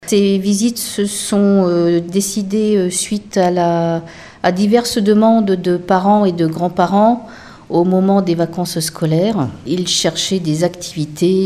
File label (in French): cathedrale enfants